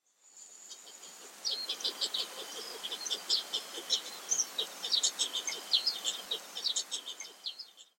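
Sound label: kurotsura, Black-faced Spoonbill; fukuoka; koe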